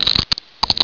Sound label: Egg Crack; BOOM